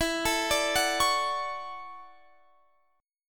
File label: D-Major 9th E; DM9/E; arpeggiated; JGuitar